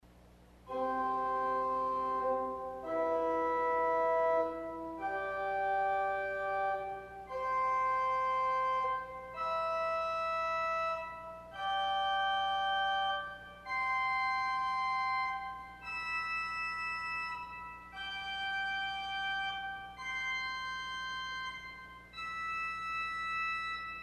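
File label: Fourniture Arpeggio, organ stop; Möller 1951; CulverAcademy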